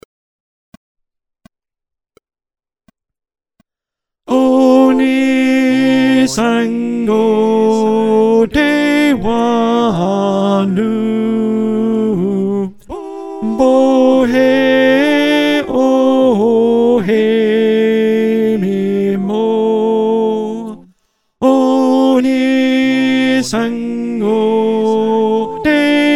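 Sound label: Tenor, choir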